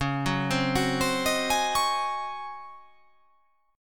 C sharp Major 7th Suspended 2nd Suspended 4th, chord